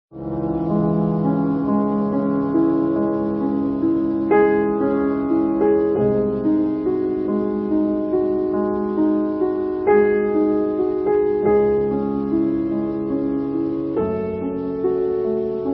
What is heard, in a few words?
piano cover ringtone